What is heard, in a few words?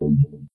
menu deny